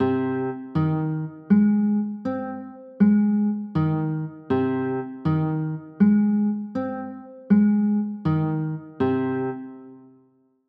g sharp maj; chords

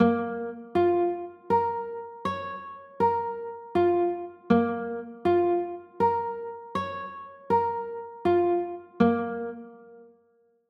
a sharp m, chords; pos 3

